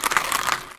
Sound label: Foley